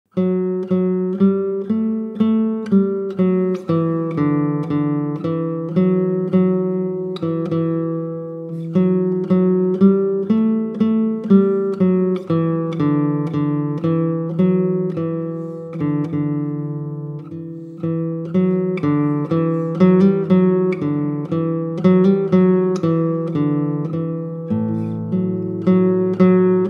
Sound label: Symphony No 9 Ba Guitar